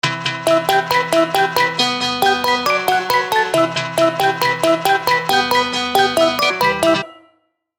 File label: piano